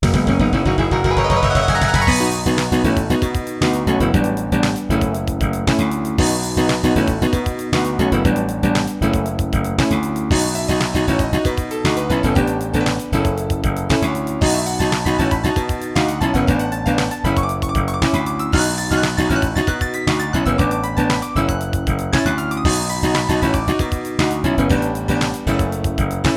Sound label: Sound Saurus; Legend of Kyrandia Dragonflight